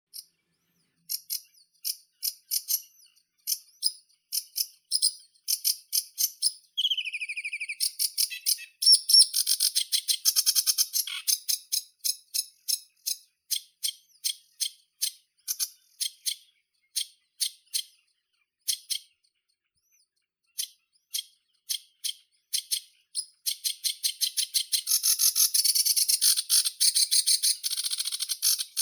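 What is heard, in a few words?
sedge warbler, Acrocephalus schoenobaenus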